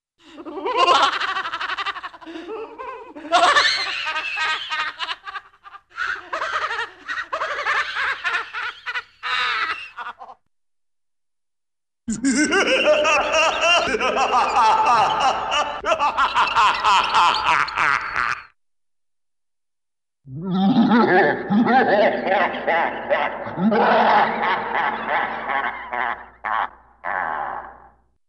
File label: Hilarious Man Laughing - Big Devil Laugh, Cartoon; Hollywood Edge; Cartoon Trax